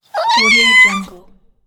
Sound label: kitten meow